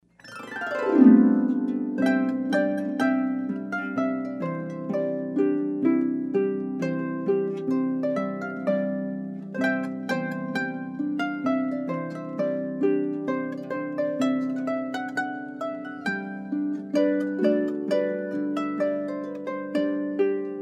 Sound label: Trumpet Tune